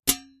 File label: hit Helmet, Swords; Foley; Kenney's Sound Pack